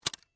Mini DV Camera, Open, Inner, Cassette, Door, Canon, Zr800; Technology, Mini, Dv, Camera; Blast Wave